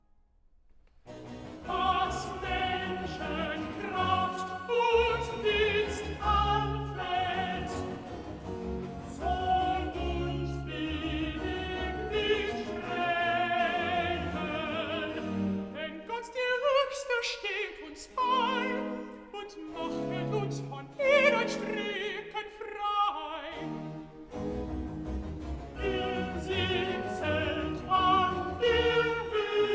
Cantata, Choral e Recitativo; BWV 178 'Wo Gott der Herr nicht bei uns hält'; Bach; Rendsburg; 8th Sun After Trinity

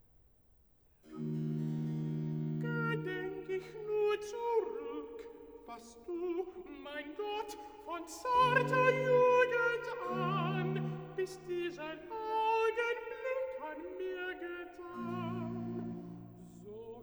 Cantata, Recitativo (Alt); BWV 69a 'Lobe den Herrn, meine Seele'; 'Gedenk ich nur zurück'; Bach; Köthen; 12th Sun After Trinity

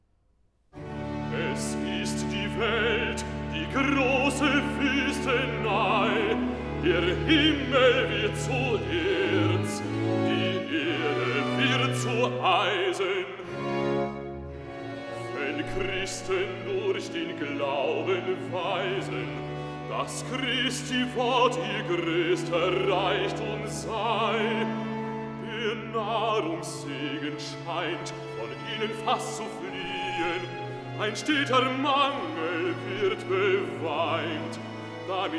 Cantata, Recitativo; BWV 186 'Ärgre dich, o Seele, nicht'; Bach; Haddington; 7th Sun After Trinity

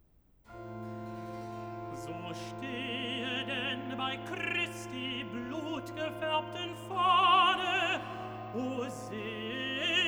Cantata, Recitativo (Tenor); BWV 80 'Ein feste Burg ist unser Gott'; VI. Recitativo (Tenor) 'So stehe dann bei Chris; Bach; Wittenberg; Feast of the Reformation

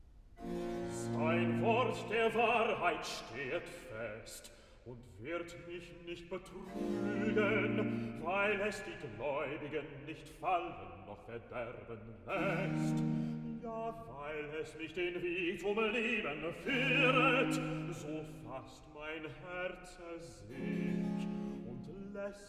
Cantata, Recitativo (Bass); BWV 99 'Was Gott tut, das ist wohlgetan II'; Bach; Bremen; 15th Sun After Trinity

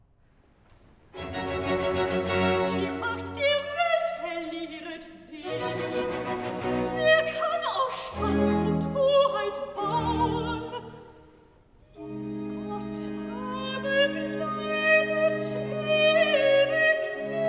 Cantata, Recitativo (Sopran); BWV 188 'Ich habe meine Zuversicht'; V. Recitativo (Sopran) 'Die Macht der Welt verlier; Bach; Greenwich; 21st Sun After Trinity

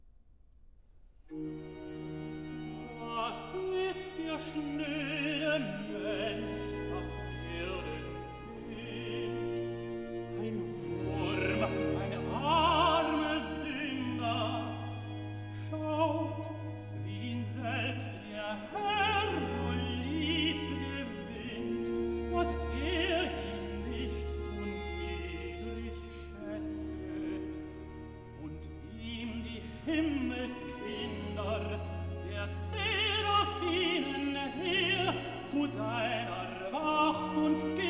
Cantata, Recitativo (Tenor); BWV 19 'Es erhub sich ein Streit'; 'Was ist der schnöde Mensh; Bach; Bremen; Feast of St Michael & All Angels

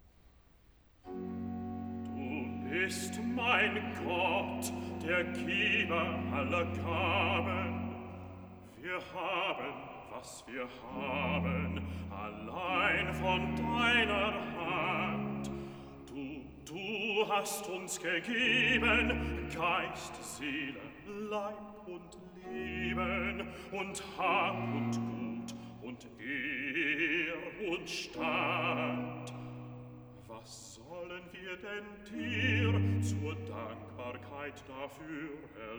Cantata, Recitativo (Bass); BWV 163 'Nur jedem das Seine'; Bach; Winchester; 23rd Sun After Trinity

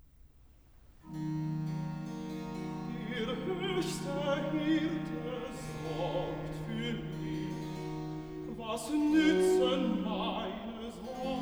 Recitativo (Tenor); Cantata, BWV 104 'Du Hirte Israel, höre'; Bach; Echternach; 2nd Sun After Easter

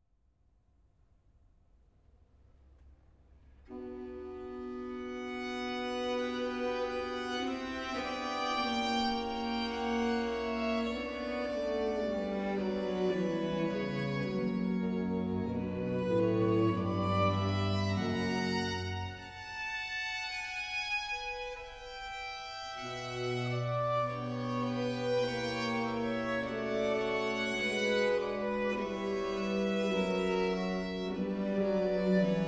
Sinfonia; Cantata, BWV 150 'Nach dir, Herr, verlanget mich'; Bach; Arnstadt; 1st Sun After Easter

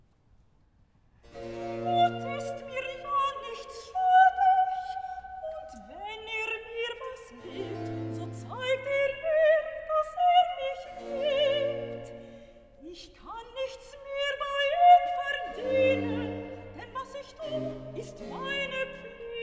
Recitativo; Cantata, BWV 84 'Ich bin vergnügt mit meinem Glücke'; Bach; Naarden; Septuagesima

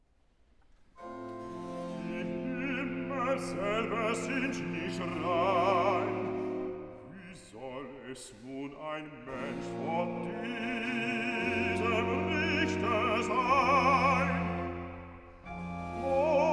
Cantata, Recitativo; BWV 136 'Erforsche mich, Gott, und erfahre mein Herz'; Bach; Rendsburg; 8th Sun After Trinity

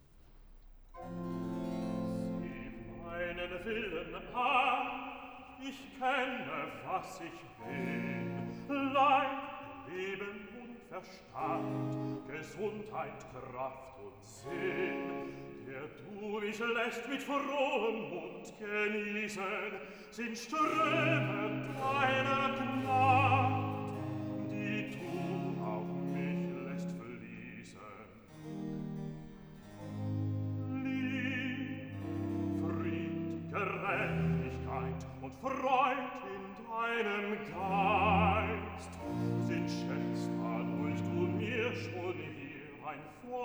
Cantata, Recitativo (Bass); BWV 17 'Wer Dank opfert, der preiset mich'; Bach; Ambronay; 14th Sun After Trinity

